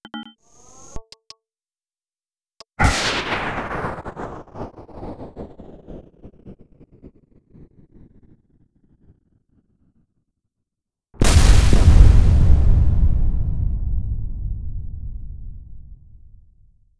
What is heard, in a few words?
rocket